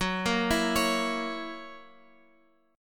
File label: Gb 7th Gb down Guitar Open F; arpeggiated; JGuitar